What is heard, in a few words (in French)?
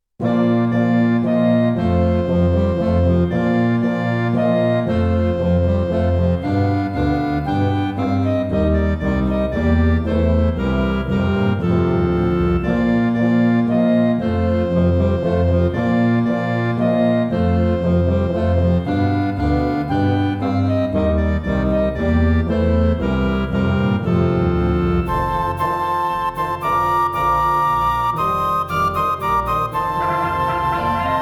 Menuet; PETITE SUITE